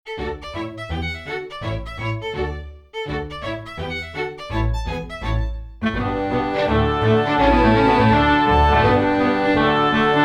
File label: orch